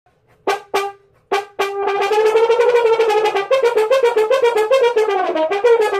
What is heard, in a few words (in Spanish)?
Adorno tuba (Fa) articulado y ligado